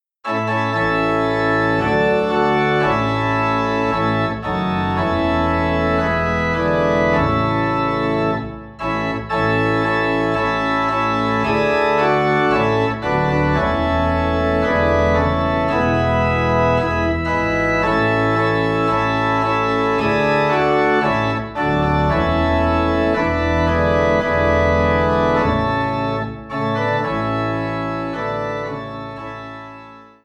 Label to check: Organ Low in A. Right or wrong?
Right